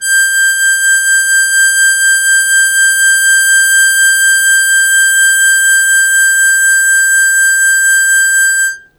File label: Long Sustain Fast Wah Wah G3, Harmonica; Zero G - Harmonica